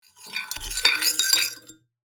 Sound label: Spoon in glass stirring drink; household